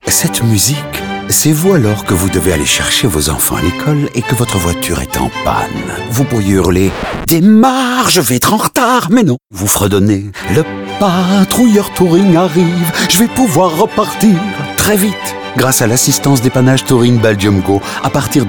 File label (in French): Mozart sérénade; Radio Winter FR30s